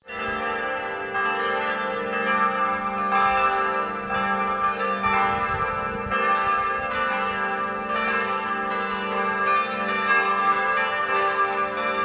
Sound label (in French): cloches